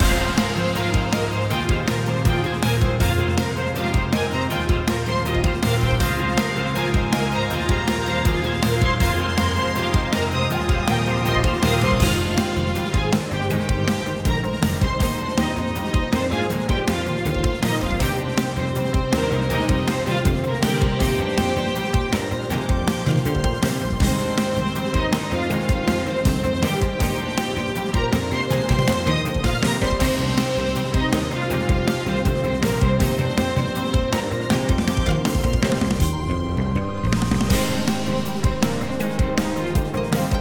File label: Boss Music Phase 1; HighPriestess; Spiritfall